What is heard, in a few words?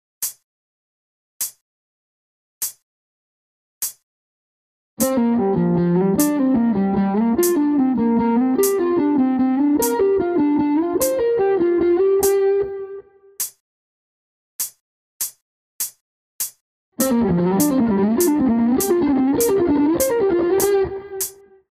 penta Slide